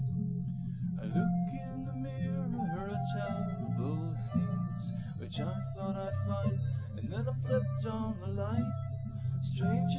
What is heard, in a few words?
nodrums liverec 250ms 5cm; SiSEC08; SiSEC underdetermined